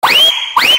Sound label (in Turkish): islik korna